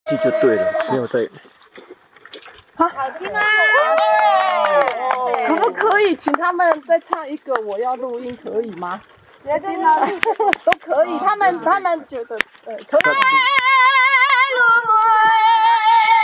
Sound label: Tibetan Song